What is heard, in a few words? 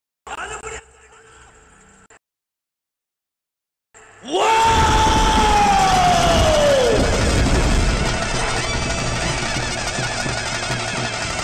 wow sound; kantara